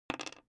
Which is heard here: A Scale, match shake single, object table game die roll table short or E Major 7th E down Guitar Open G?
object table game die roll table short